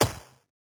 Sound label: 8-Bit Gun Shot; SoundsCrate